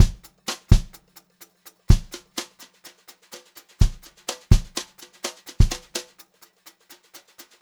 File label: Brushed Drum Loops, JAZZY; USB Soundscan vol.39; AKAI; 90 s Sample CDs; 126 JAZZY